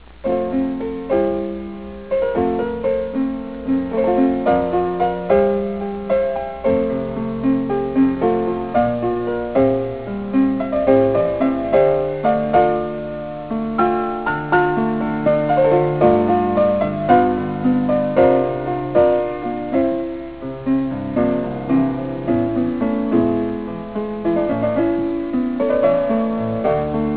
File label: rca piano